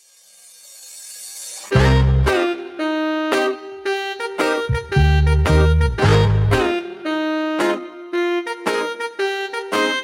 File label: Beat Remix instrumental Slowed